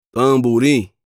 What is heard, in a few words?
Tamborim